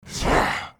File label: growl; shaoxiadiablo